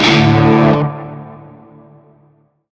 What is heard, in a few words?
Shawzin Chromatic Chord; Warframe; Narmer Shawzin